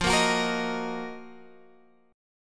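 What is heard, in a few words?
E flat Minor, F; Ebm/F strummed; JGuitar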